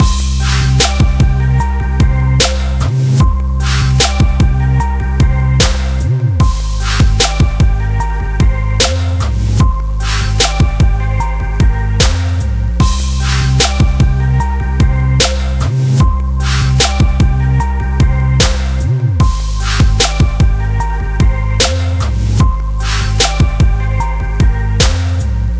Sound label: Lose; forza street